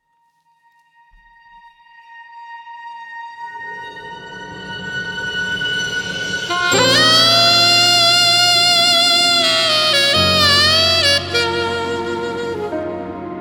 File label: Alto Sax